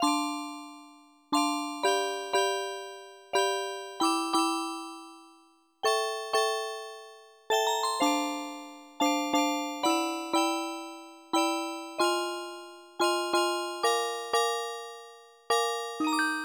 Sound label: music box